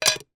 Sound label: foley small metal tin lid down on table; zapsplat